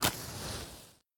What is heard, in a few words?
Light Candle; The Town